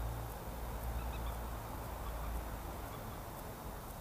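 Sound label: Moorhen call, AudioMoth in bag; nocmig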